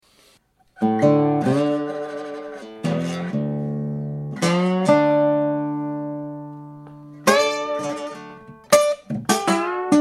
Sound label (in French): Slide Bottleneck Enregistrement de salon Pas d'effets sonores, guitars; DAT; par Silvestre Borras